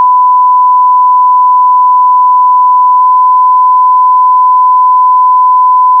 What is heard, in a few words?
1000hz Loop